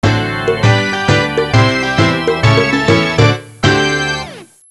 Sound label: jazz sign